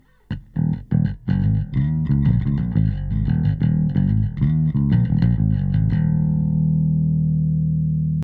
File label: bass; Pulsar